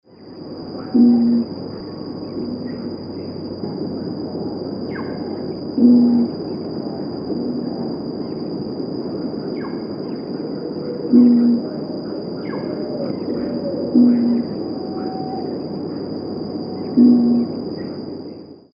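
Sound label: Yacutoro, Pyroderus scutatus, Red-ruffed Fruitcrow; cantos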